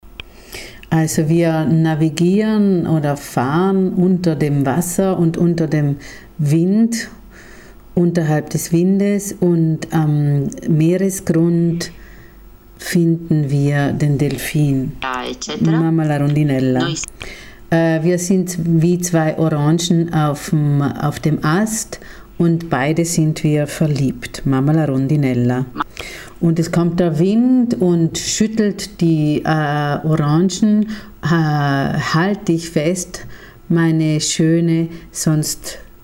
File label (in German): rondinella deutsch; Übersetzung deutsch